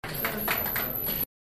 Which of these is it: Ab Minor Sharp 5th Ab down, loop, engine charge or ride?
loop